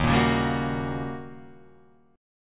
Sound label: D sharp 7th Sharp 9th Guitar Half Step Down